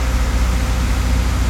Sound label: Machine Generator Loop; Machinery - Generators